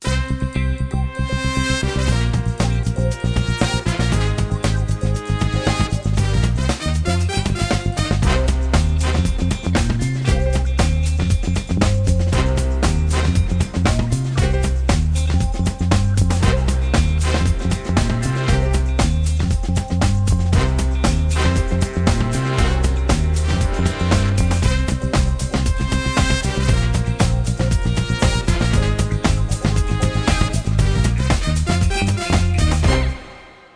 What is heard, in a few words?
Funk, gamerip soundtrack; Backyard Hockey